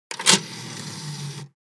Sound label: Vintage CD player old tray open